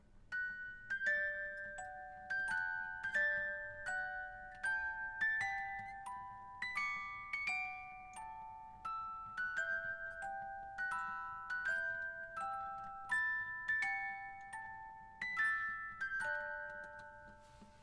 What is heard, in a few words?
Hymne Greece